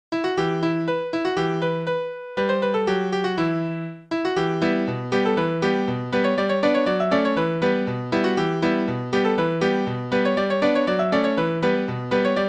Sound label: piano theme; angry birds